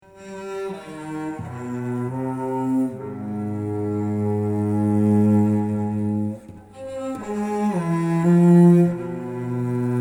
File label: Sarabande